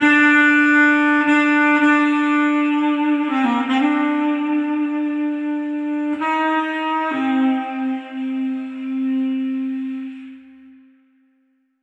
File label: Baritone Effect, sax; Effected; Sonic Foundry (Sony Creative Software); Jazz Solos and Sections